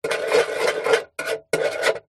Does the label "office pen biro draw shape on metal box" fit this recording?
Yes